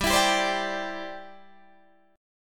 C-Major Add 9th G, Guitar Half Step Down

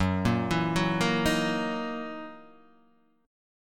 Gb 13th Gb down Guitar Half Step Down

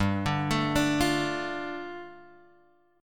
G 7th Suspended 2nd G down Guitar Open G

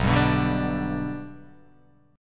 B Minor Sharp 5th

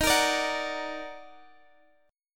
Eb Minor Major 7th Eb down Guitar Half Step Down; strummed

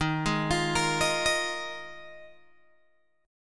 Eb Suspended 2nd Suspended 4th, Guitar, Open G

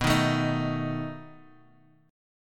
B 7th B

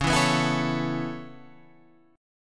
Db Minor 7th Sharp 5th Db down Guitar Open G

